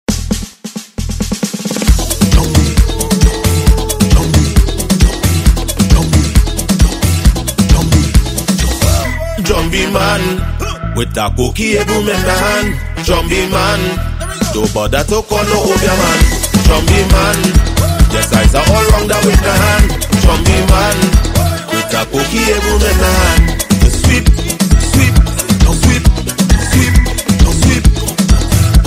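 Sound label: sweep, soca grooves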